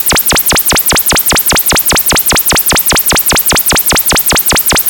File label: Burn-In Sound